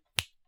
finger snap mini room

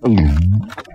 bassy big gulp; Body sounds

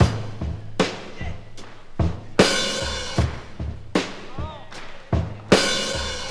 76 bpm high quality drum loop A key

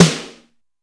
big room snare E key

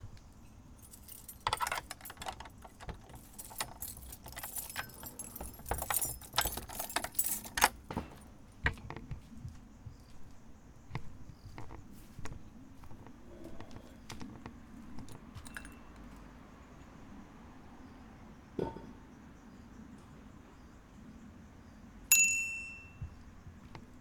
garage door lock and bike horn ding

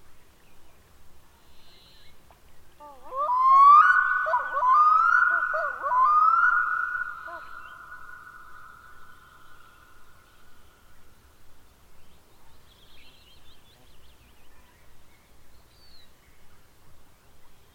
arctic divers call and insects; soundscapes

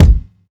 bassy bass drum sample a sharp key; kick drum samples

